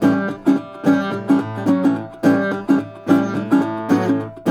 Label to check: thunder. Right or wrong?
Wrong